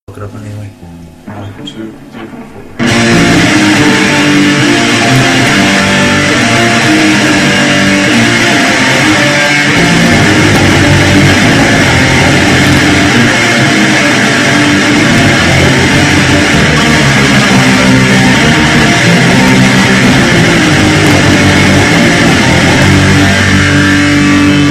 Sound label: bass exploding